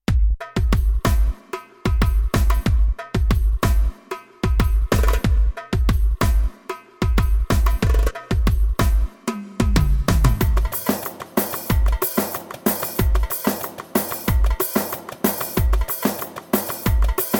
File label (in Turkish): misket ritm, yamaha or700; dreamsongs